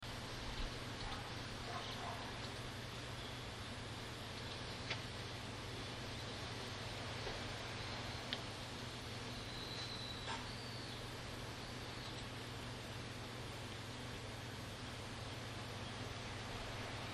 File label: car